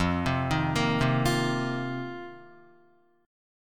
F 7th Suspended 4th F down Guitar; arpeggiated; JGuitar

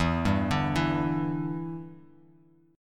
E Minor 7th Bass Standard; arpeggiated

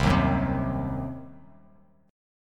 C Diminished C, Bass Standard; strummed; JGuitar

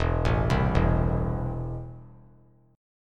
E Suspended 4th Sharp 5th; arpeggiated; JGuitar

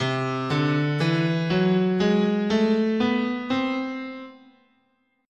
C Lydian Augmented, scale

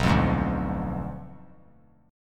B Diminished 7th B down; Bdim7 strummed

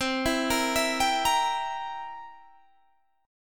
C 7th C, Guitar Open G, chord

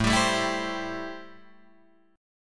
A-Minor 7th A Guitar Drop D

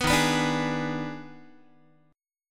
Eb Major 7th Suspended 4th Sharp 5th Eb, Guitar; strummed; EbM7sus4#5

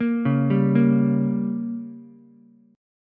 G Diminished, Ukulele; arpeggiated; JGuitar

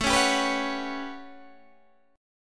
A 13th, Guitar Standard, chord; strummed

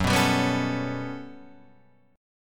G Major Sharp 11th F sharp, chord

